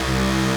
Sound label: laser fire; the arena